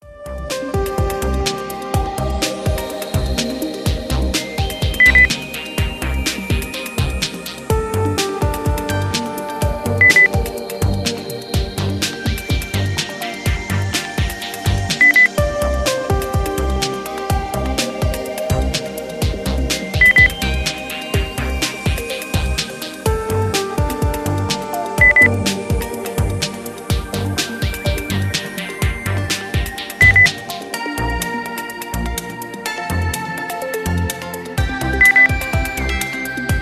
lounge

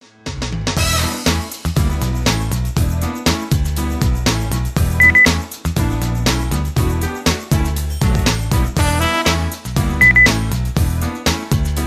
jazz thinking jazz beep

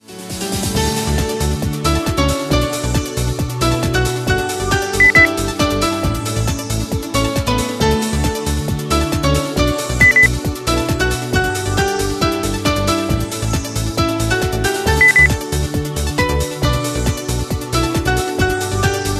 pop beep

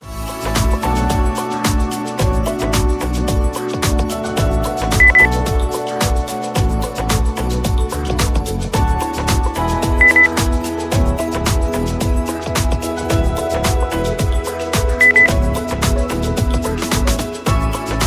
pop beep

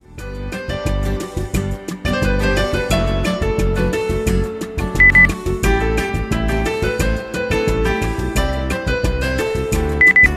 pop acoustic swing

